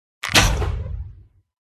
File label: cat attack voice middle